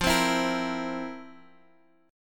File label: F Major 7th Suspended 4th